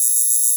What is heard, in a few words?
SID whine, Analog; Xfer Records Serum; Serum Presets